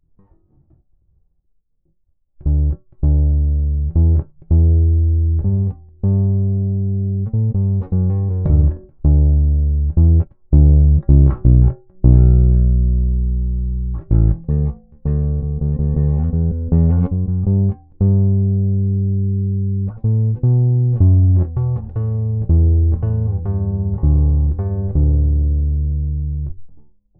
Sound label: bass chorus